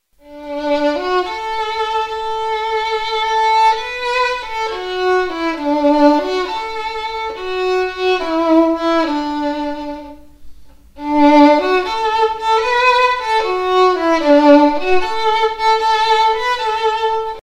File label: violin